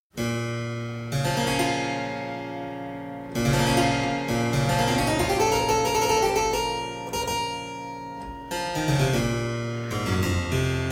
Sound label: Prelude, Harpsichord Music; Colin Booth; Louis Couperin